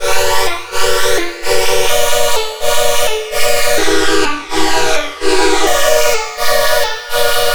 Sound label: Bb 127, Synth Loops 127bpm; Zero-G; Soundclash Nu Dance Electro; Acidized